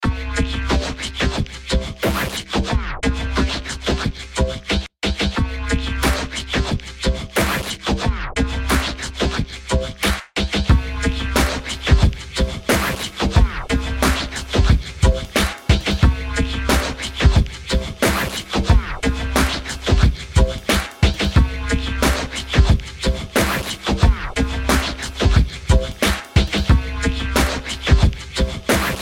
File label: Hip Hop